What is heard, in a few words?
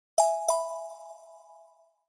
chat message ring